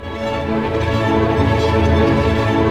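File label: strings arpeggio major, symphony orchestra; Roland LCDP08 Symphony Orchestra; STR Arpeggios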